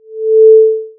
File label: Window Functions, Granular Synthesis